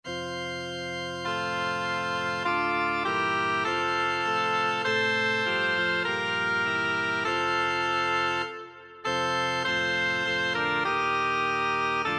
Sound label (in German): sopran; isaac heinrich; insbruch ich muss dich lassen